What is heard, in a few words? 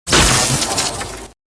buzz